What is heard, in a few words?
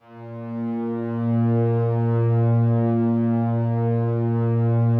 slow strings A#1, Yamaha VP-1; 90 s Sample CDs; Music Store